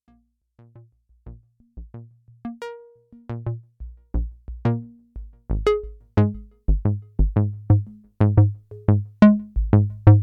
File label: Analog Sequence, synthesizer; PERfourMER